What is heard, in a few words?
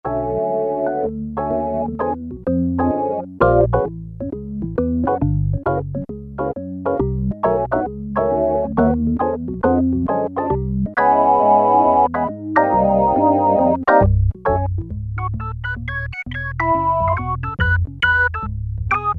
Jazz Organ, Roland MKS-70